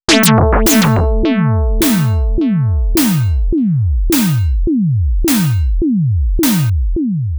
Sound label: FM komplex